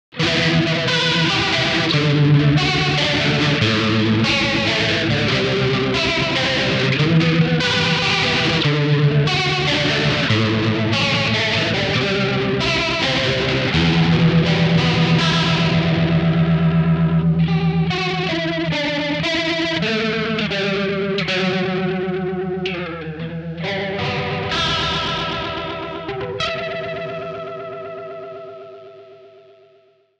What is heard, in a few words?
tremolo chorus; MAXON CS550